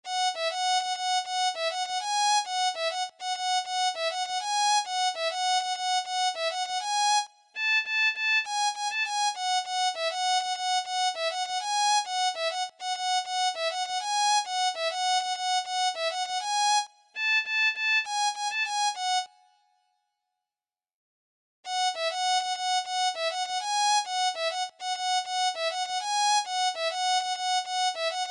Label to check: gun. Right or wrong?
Wrong